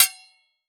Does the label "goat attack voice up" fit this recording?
No